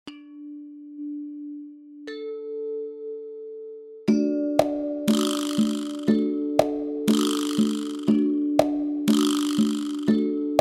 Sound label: vocal